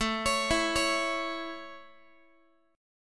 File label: A Major A, Guitar; arpeggiated; x,17,16,14,17,x